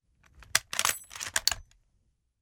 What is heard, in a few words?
m44 fast; M44 bolt action fast speed; Ejecting empty case and reloading next round; Vigesaa Productions